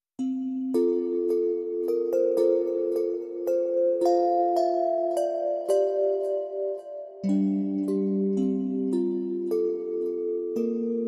Twinkly Away In A Manger

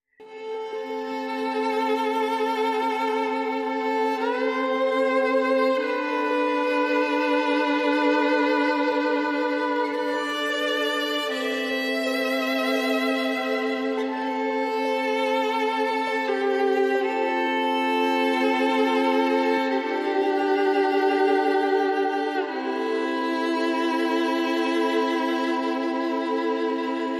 Violin

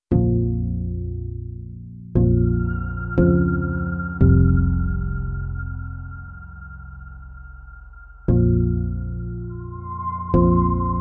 Neutral Motion, Piano No Drums; 60sec

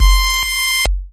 Glitch Beep

